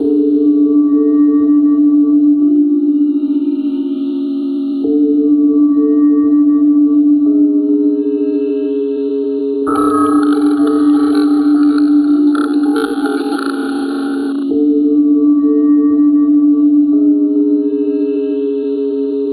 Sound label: Mystery Pad; Sonic Foundry (Sony Creative Software); Loop Noir